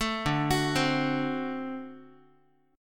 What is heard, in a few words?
D 7th, down, Guitar; arpeggiated; 12,10,9,10,x,x